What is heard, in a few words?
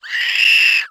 Ant dies squeel; blastmorph pinball; xbox series xs